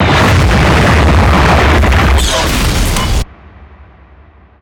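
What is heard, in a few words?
Explosion Monster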